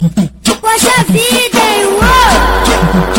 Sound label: ringtone